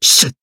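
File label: Voice attack, Japanese; zeviro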